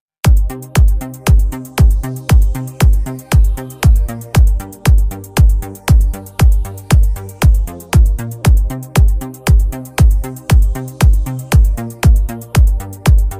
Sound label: italo disco, Yamaha psr s 770; new italo disco 2022; dariusz ejdys